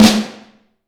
Vintage Snare Drum; Q Up Arts; Johnny.C's Vintage Snare Drum; BLK BEAUT

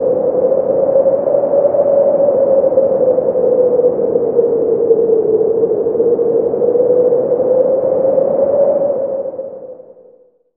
synth sfx; Chillout (ambient1&2)

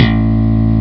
m1 slap, Amiga Soundtracker Sample Packs; ST-XX Amiga Soundtracker Sample Packs

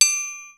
RY-30 PERCUS; Rubber Chicken Software Sample Bank

